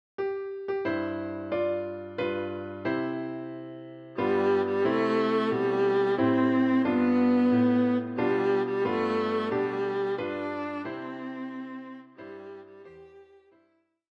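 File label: viola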